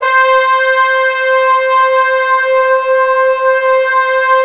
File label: C4, Orchestral Brass; Best Service ProSamples vol.20; AKAI